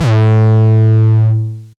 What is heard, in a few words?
808 Dist - A2, Kicks; Mbase 11 Kicks